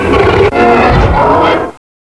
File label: claw attack right; tubequeen